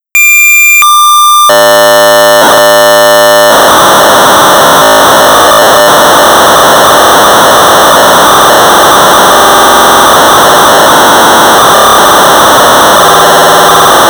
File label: Sorcerer 1200baud; Asteroids; J.T.Staley